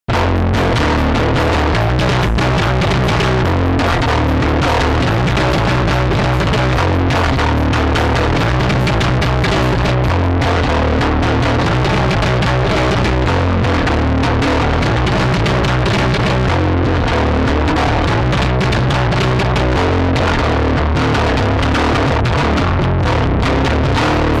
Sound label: metal drive modern metal; EBS